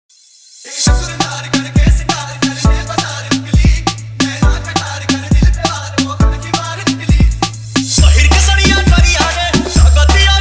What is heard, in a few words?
Edm Mix